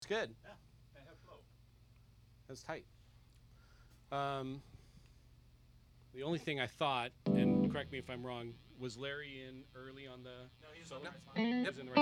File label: Loud Mix